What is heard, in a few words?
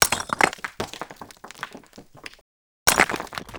Stone Break; Resynth